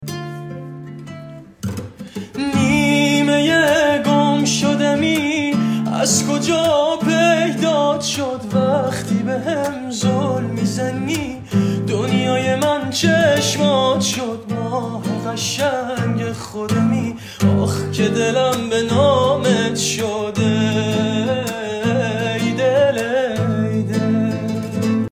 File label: Guitar